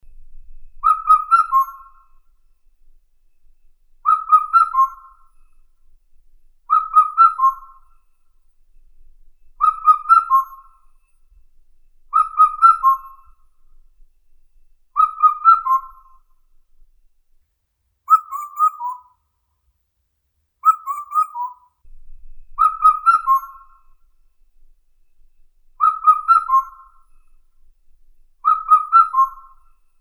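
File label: indian cuckoo, cuculus micropterus; kukushka indiiskaya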